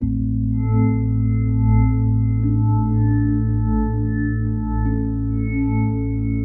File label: melody